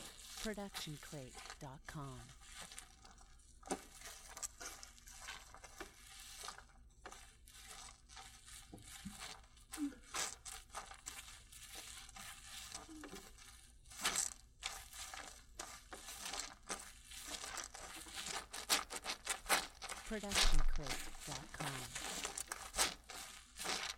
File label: gross alien gooey sounds